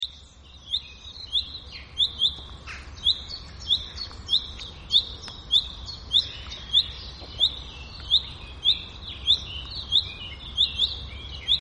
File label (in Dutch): Vink Fringilla coelebs Geluid; Annelies van Klinken